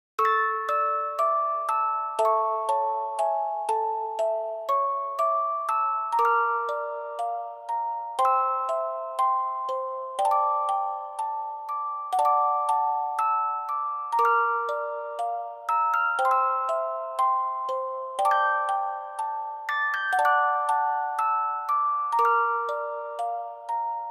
gameover

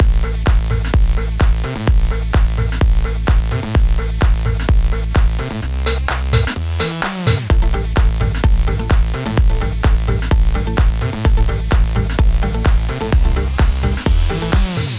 Music Loop

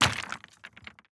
masonry break

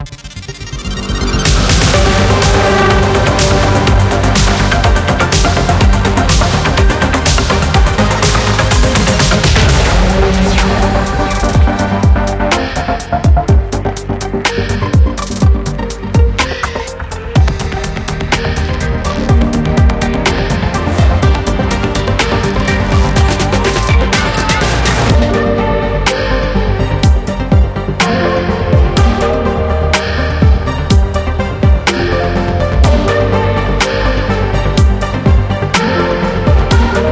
Victory Theme

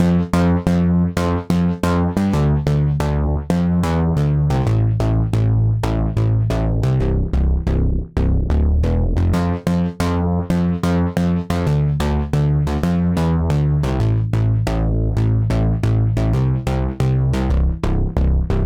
Poly Bass; super crush k.o.

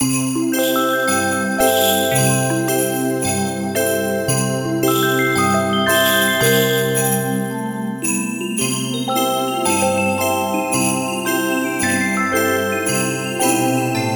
Base Idle Plant Snow; Rush2

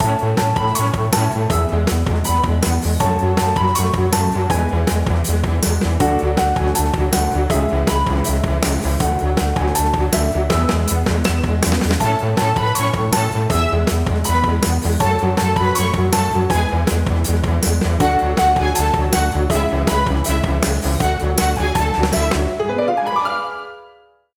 Introduction Animation Music

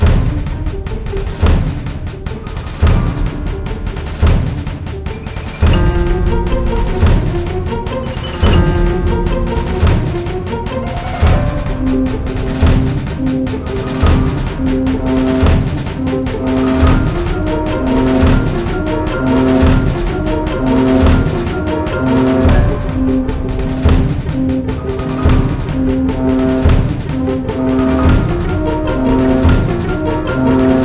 Drum Loop B; World War II Combat: Road to Berlin; Xbox

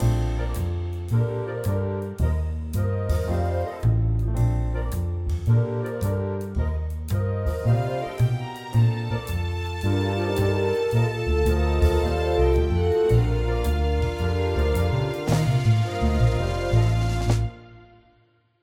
Swing Wood; tintin reporter cigars of the pharaoh

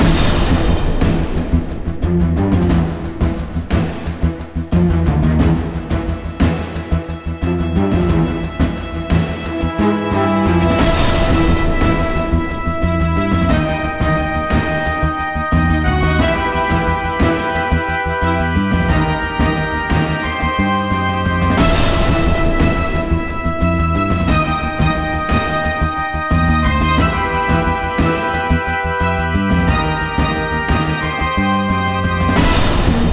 Level Theme

